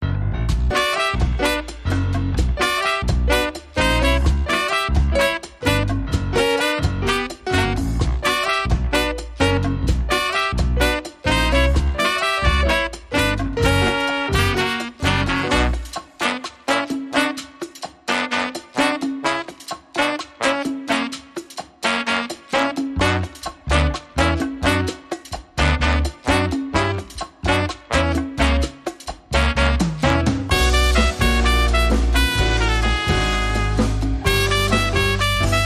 Havanna Tango

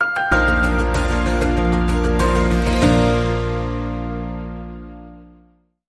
Bumper

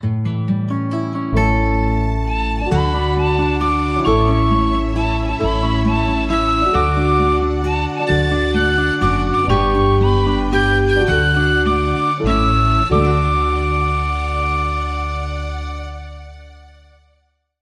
Penny Whistle Happy Birthday

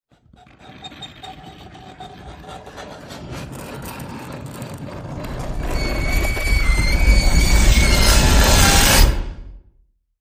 Fingernail Scratches Rise

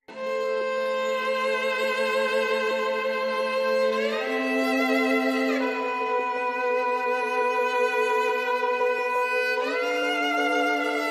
Violin

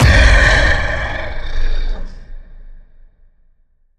Giant Troll Stomp

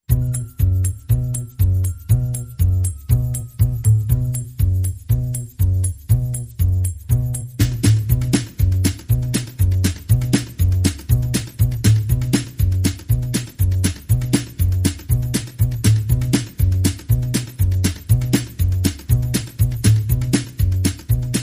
Banjo, Bass and Percussion

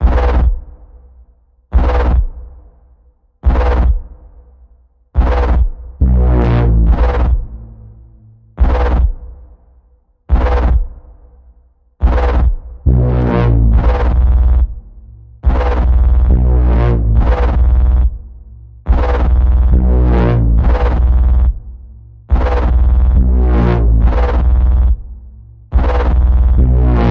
Evil Warping Bass Pulse B, Key of B

